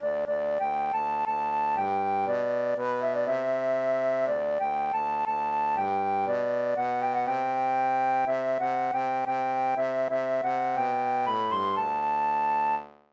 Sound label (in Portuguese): flauta trombone